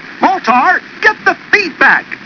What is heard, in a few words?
feedback